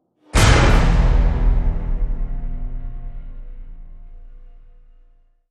Big Hit

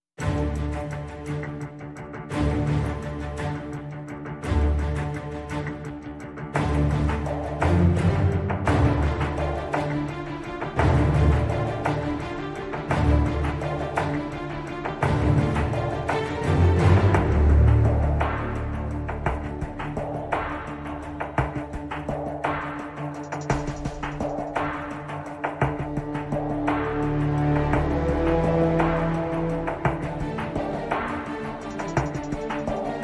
Full With Small Perc

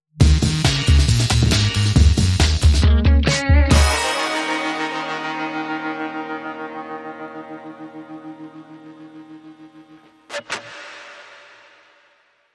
Skankin Rankin Sting

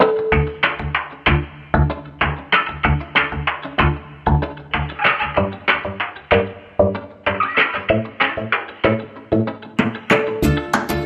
No Vocals

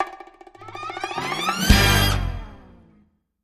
End Sting

